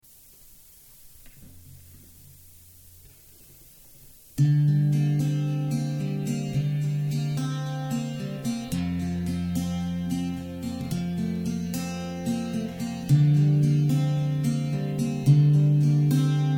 F110 Alt Tune; GRig

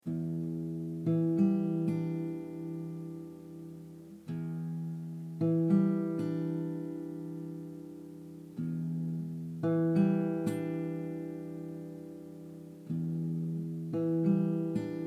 Em 112 Acoustic GTR chords

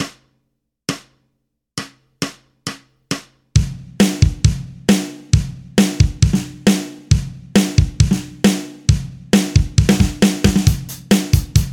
Country Rock in A 135 BPM

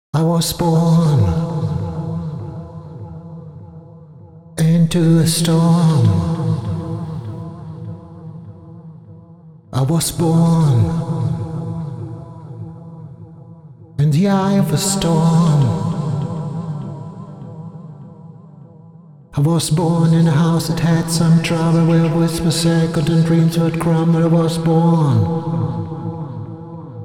vox high fx

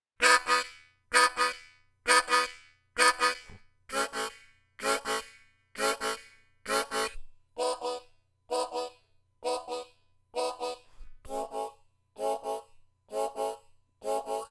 Harmonica

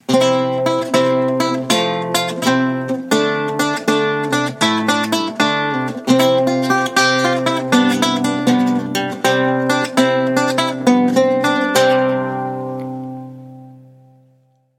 Lute; Calleno Custure Me

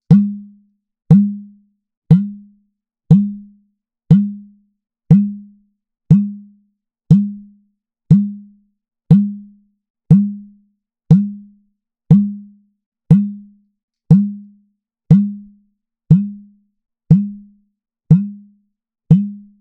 Udu (Ibo) Top Hole; Udu (Ibo) & Cajon Drums

